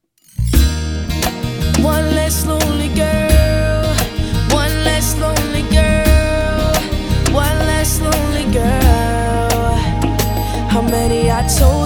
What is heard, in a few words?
acoustic